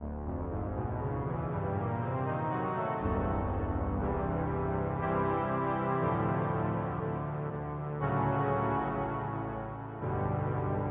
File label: Omni String Dark